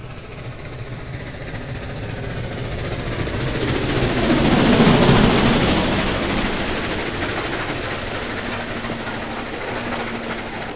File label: passing; hornby